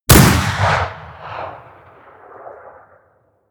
rifle remington rolling block crisp stone; schusswaffen

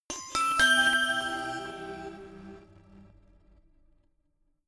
notification glockenspiel crazy triad up major; instrumente

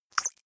click synthetic light, signale

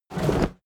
large wood pickup; berufe; foerster

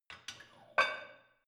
barbell light plate put on hit soft

